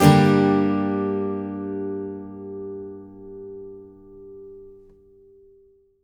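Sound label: acoustic guitar G minor up, right; Country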